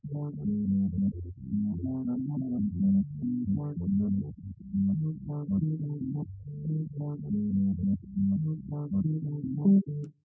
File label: wdrums; SiSEC10; underdetermined